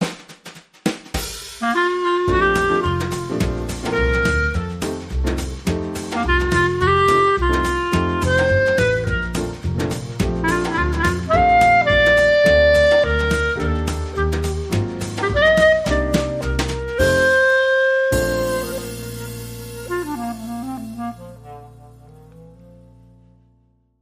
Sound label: Clarinet Swing Happy Birthday